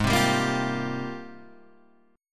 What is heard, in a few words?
G sharp Major 13th, Guitar Half Step Down; chord